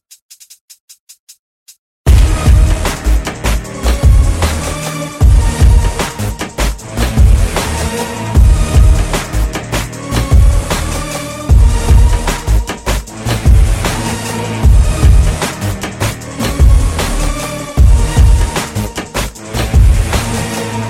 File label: black beat, hip hop